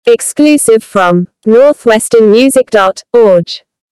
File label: Jingle; logos